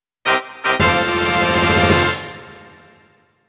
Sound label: Victory (Win Jingle); Genso Suikoden II